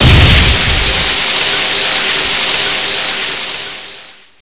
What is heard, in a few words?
steam burst; dm achluophobia